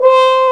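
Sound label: french horn, orchestral ensemble; Roland L-CDX-03 Disk 2; Orch.Ensembl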